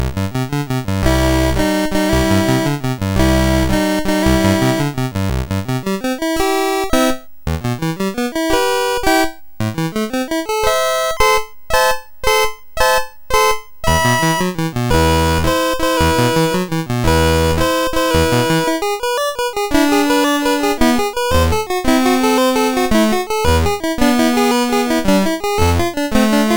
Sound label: A Passing Storm sid MOS6581R4